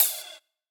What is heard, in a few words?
Ride Shot